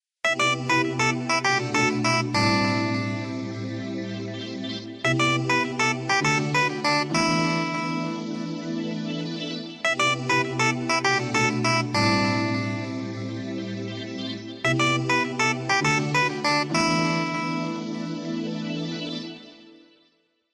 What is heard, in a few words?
Melody, Ring; Doro Liberto 820 Mini